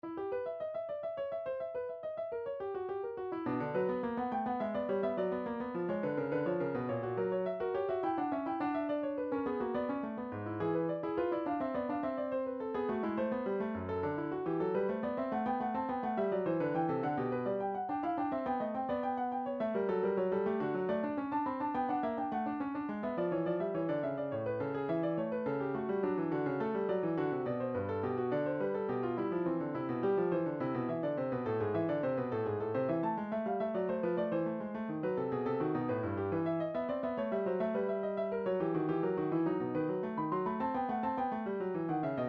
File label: classical fugue, midi